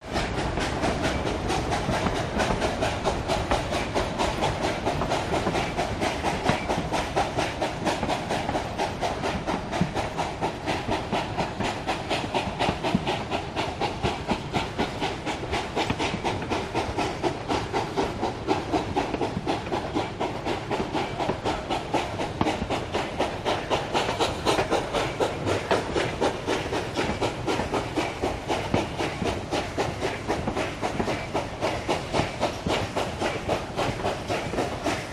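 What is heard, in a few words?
Steam Train Steady Engine, steam train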